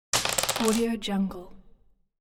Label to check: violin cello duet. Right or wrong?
Wrong